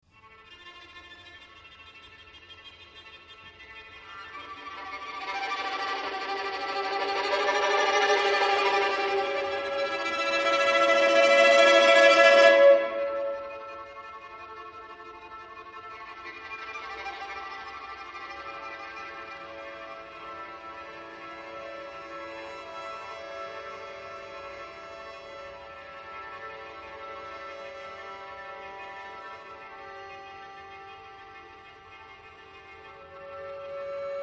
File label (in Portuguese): Quarteto de cordas